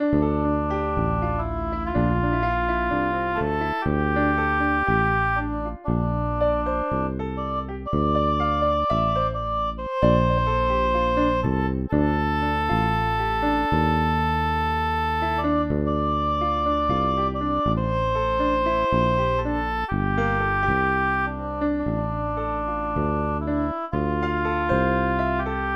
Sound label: Piano Bass Oboe